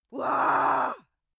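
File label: female aggressive, screams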